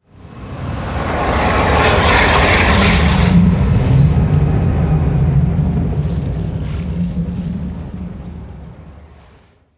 Mixer Pool Drain

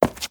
Stone Run

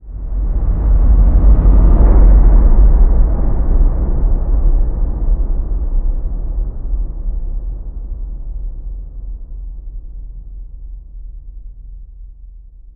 Sphere Rotation Stop

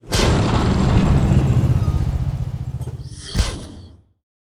Private Door Open Close; moul

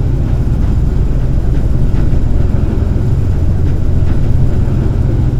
Protractor Loop; moul

